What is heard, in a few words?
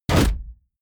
sci-fi crossbow fire; schusswaffen